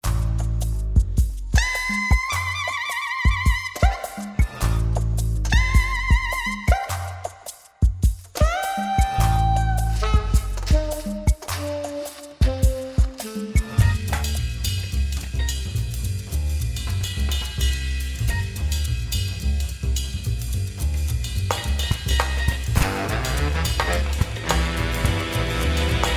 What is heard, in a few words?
Jazz